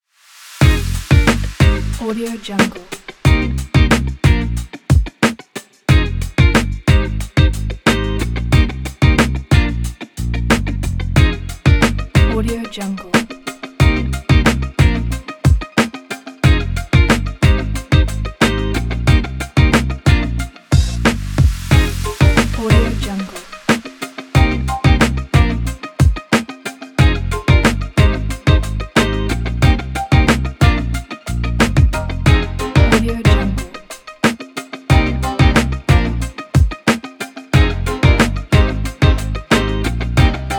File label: rb guitar hiphop